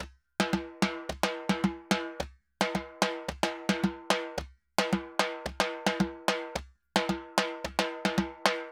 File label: Timba Murga 110 bpm; Best Service; Festa Latina VOL-1